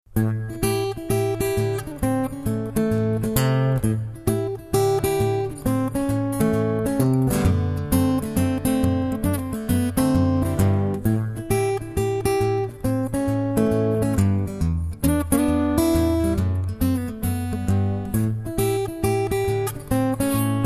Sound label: blues